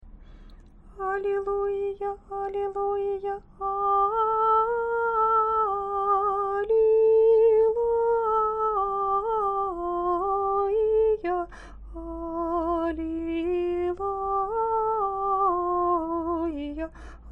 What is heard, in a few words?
halleluja, singing